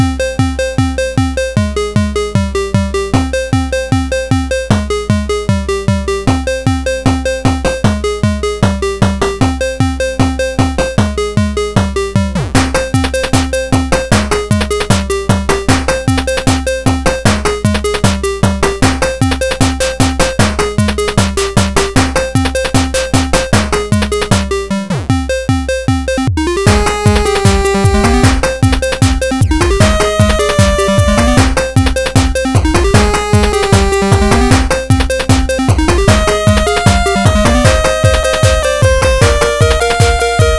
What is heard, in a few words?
song